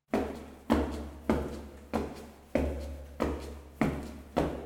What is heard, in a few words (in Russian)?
zvuk shagov